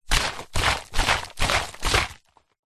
bottle 1 litre plastic mostly empty shake; food drink; pepsi; zapsplat

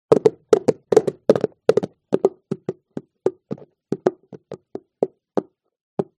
HORSE Hooves Clack Gallop Asphalt Slowdown; ANIMAL; smartsound

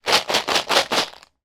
food chocolates multipack 4 shake; smarties

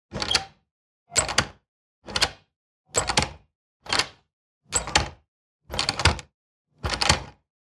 Lock Bathroom Door Small; noise creations

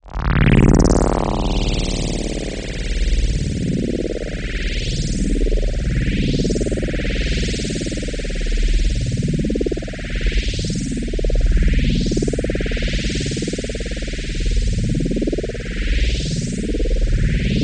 Atmosphere; helios; sumo blanco

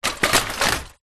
vehicles bicycles 5 fall over together

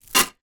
household plastic jewelry place down on table

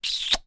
human kiss

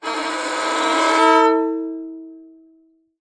musical violin single bow play badly

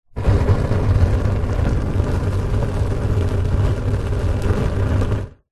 nature fire flames medium burn wind short burst; zapsplat

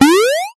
multimedia game bounce jump

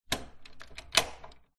household door latch lock turn; zapsplat

